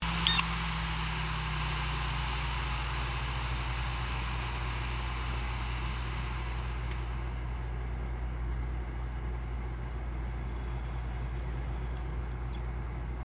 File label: Shutdown, Maxtor ATLAS V; HDD